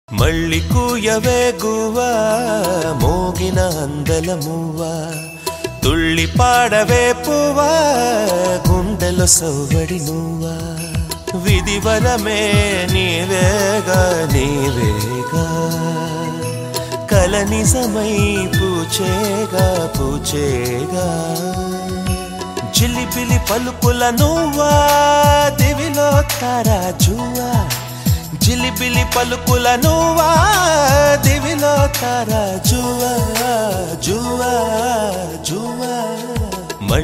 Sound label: telugu love songs; raviteja